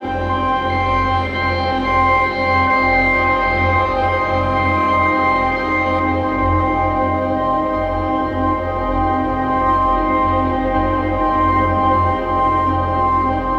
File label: Dramatic Fm